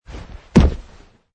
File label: Landing; Man wearing jeans and hiking shoes jumping and landing on carpet surface - variation 9; Wrestling and Struggling; Michael Huang